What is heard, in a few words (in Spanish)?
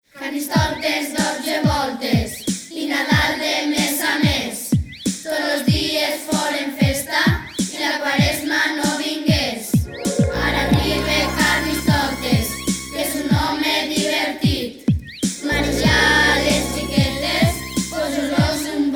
Rap de Carnaval